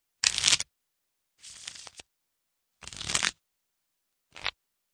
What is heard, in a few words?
Crowds; 헐리웃특수효과음; 군중-혼잡